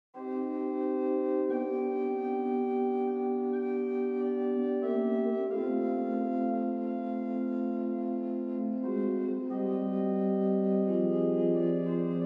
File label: Lento, OrganMusic; Boely; Souvigny